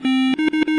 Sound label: autodarts triple sound